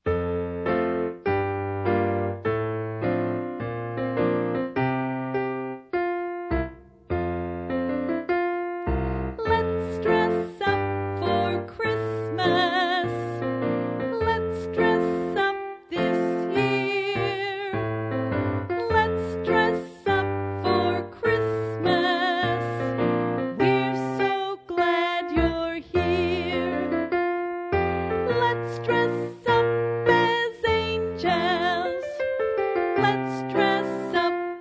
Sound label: vocal